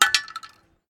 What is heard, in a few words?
Shell 12ga metal; STALKER; IWP